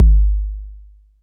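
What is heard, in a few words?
bass drum 808 Sat Click Decay C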